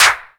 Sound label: Snare clap, Hip Hop; Akai MPC500